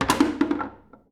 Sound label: Foosball Goal Score